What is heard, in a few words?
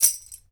BEL RATTL, African Drum Percussion; Drums&Percussion; E-MU Producer Series Vol. 7 – Old World Instruments